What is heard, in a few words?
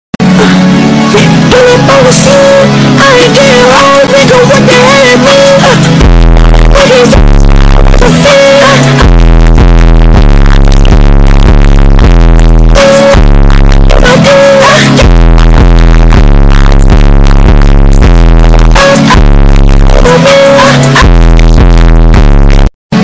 bass boosted